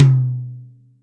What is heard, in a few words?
Maple, Acoustic Tom, Yamaha DTXpress